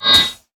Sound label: hamstring; Darius